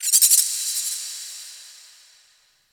Ocean Underwater Critter Small; Warframe; Grineer Ocean

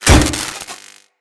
Electro Prod Hit; Weapons

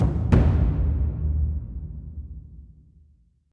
Rand Drum; Hubs; Syndicate Arbiters

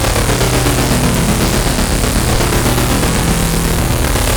Modular Secondary Barrel Beam Loop, Weapons; SU Modular Guns; Barrels

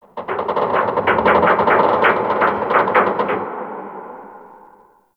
Exterior Metal Debris Creaks; Warframe; RailJack; Ambience

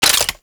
Rocket Launcher Low Clip Mech; UT Weapons

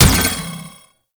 Bounce Projectile Hit; Trapper

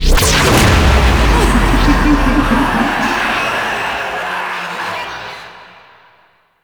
Infested Scream Stinger; Warframe; NineteenNinetyNine; Stage12PartyOfALifetime

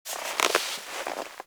Classroom Move Foley; Ambience; Warframe; TheNewWar; Zariman

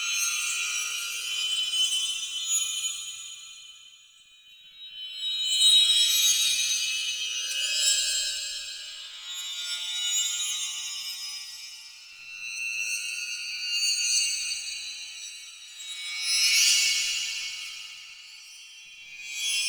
Reverse Chimes Loop; Warframe; Shadowgrapher; Interior

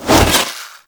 Melee Whoosh

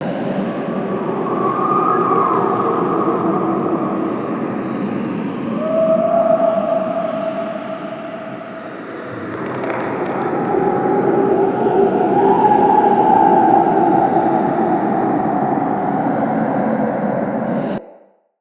Corrupted Exterior Bed Loop, Ambience

